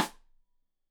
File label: Side Stick Stereo; Deeply Sampled Drums; SMDrum